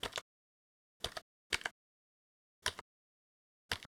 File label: Keyboard slow; keyboard tapping